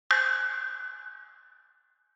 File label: Single Accent Dry